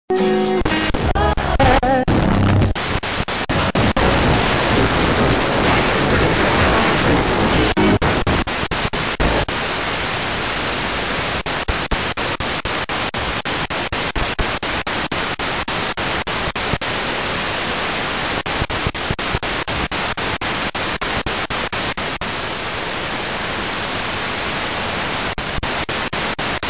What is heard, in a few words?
85 110 MHz